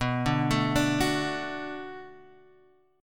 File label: D 6th B, chord